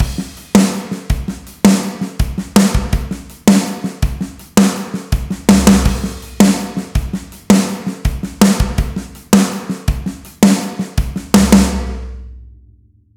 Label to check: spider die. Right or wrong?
Wrong